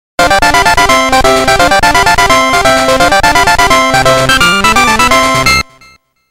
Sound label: Victory Dance; Block Ball